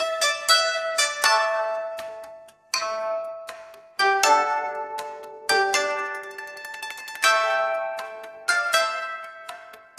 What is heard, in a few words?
japanese traditional, musicgen